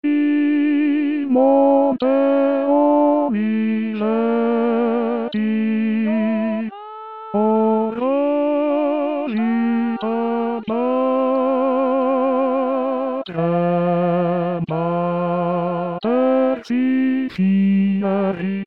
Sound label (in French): hommes